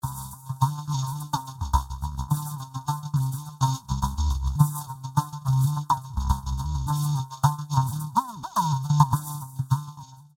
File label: vocoder nature play the bass; els vocoder eiosis